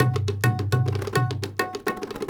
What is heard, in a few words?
INDIA L; Spectrasonics - Heart of Asia CD2